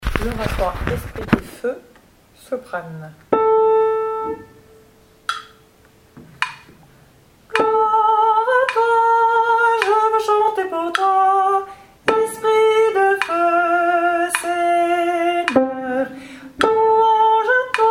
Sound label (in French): SOPRANE; Gloire à toi Esprit de feu